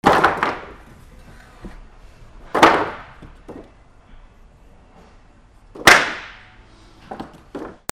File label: FALLING